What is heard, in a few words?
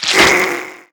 NPC Killed